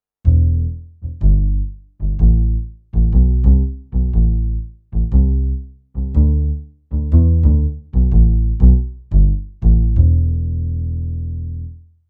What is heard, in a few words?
kawai cs10 bass